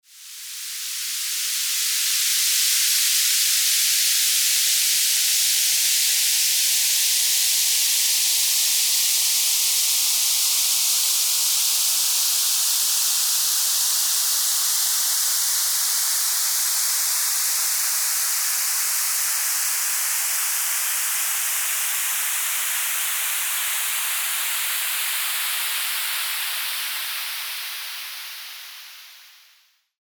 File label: tinnitus relief high frequency sweeping notch 8 16kHz noise